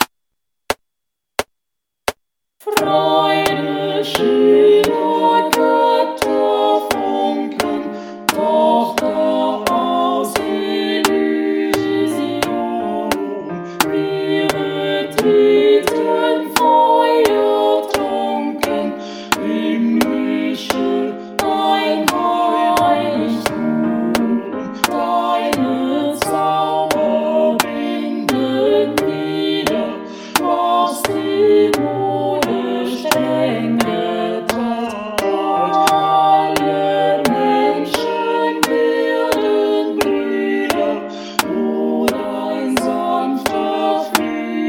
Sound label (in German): Ode an die Freude klassisch chor